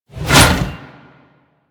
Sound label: Stalker Scythe Whoosh; Warframe